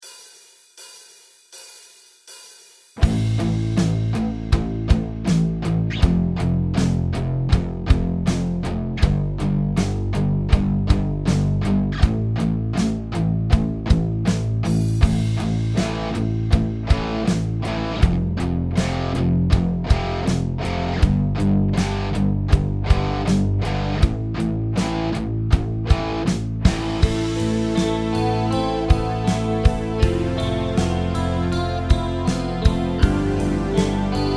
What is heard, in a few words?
Single Note Riff Song Backing Track